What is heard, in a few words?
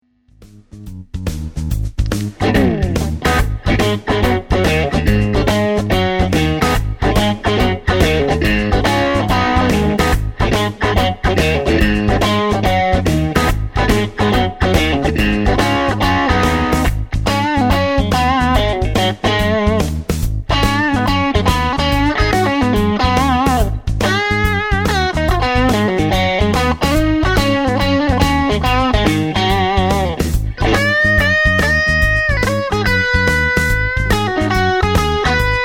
Crunch Mid Bridge, Strat; schwarzbach; VP-Strat-IrishTour